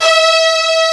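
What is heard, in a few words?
KURZ A5, strings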